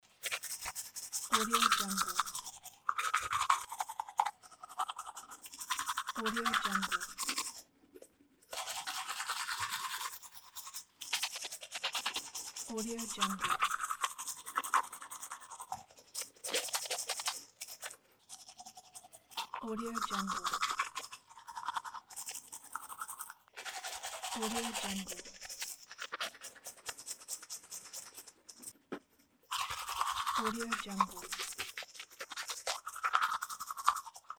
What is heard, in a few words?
brushing teeth